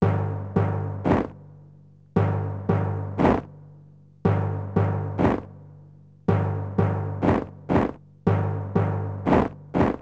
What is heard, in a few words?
Ditty; NBA ShootOut 2002; PS1